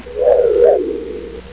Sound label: scanner